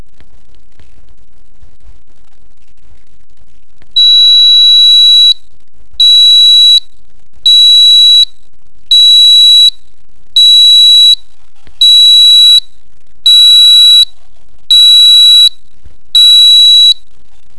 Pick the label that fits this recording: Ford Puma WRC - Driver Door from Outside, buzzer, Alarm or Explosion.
buzzer